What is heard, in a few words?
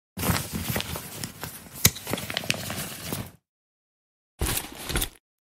backpack